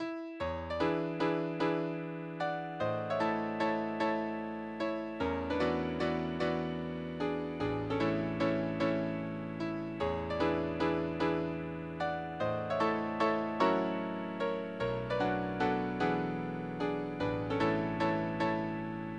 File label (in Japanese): 楽譜 midi Pedal